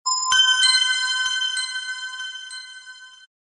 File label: flourish low magical twinkle; fantasy